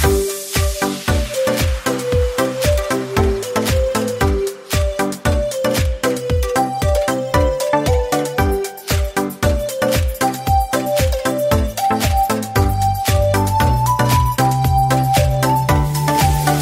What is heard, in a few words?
Vivo Ringtone; Vivo T2x (India)